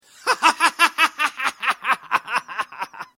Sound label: Laugh Male Laughter Laughing Male; human sound effects; Lynne Music